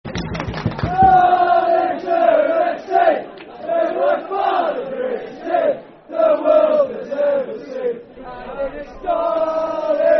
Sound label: Football Chant, Darlington; Darlington Fc; Darlington Dfc